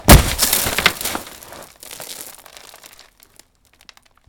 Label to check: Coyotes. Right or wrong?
Wrong